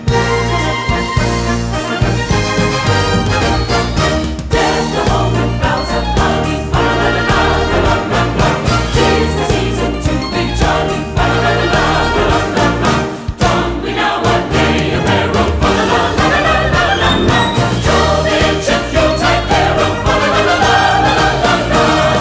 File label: Jingle Bell Medley Deck The Halls vocals; Sawgrass Elementary